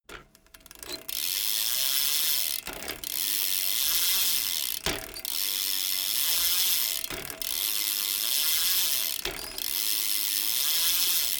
bmx bike pedal once freewheel

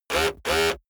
servo buzz short

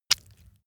leather impact mud medium

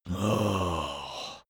zombie moan idle

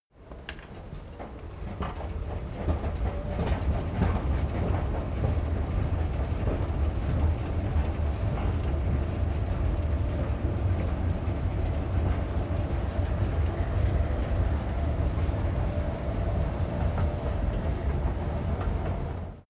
escalator take upstairs

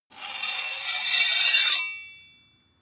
sword blade grind long

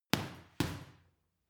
boxing punching bag uppercut double hard

